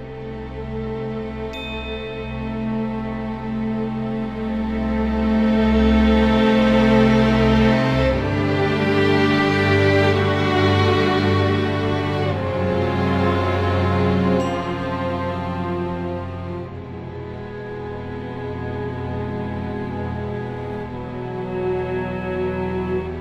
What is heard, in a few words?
P V P Defeat Loop